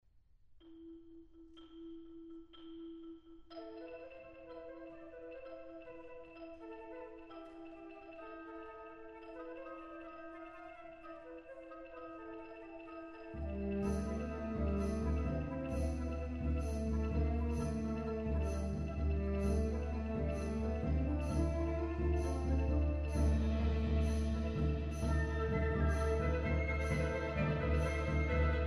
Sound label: Symphonic band